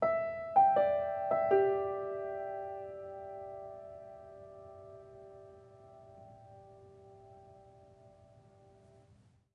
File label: Music Level Argument Stinger; Florence